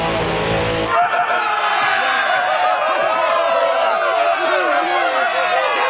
crowd cheering; pucca

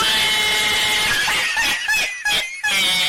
cat laughing at you

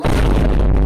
vine boom bass boosted man

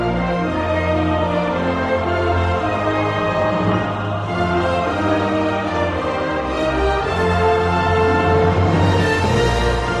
theme; jurassic world

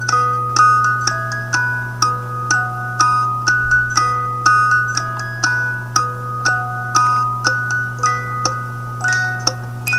music box; fnaf 2

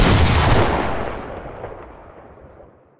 pump shotgun loud; fortnite

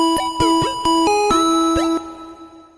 classic pokemon heal

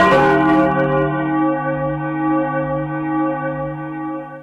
creepy church death bell

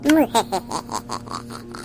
evil laugh; roblox game

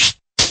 light swish and smack

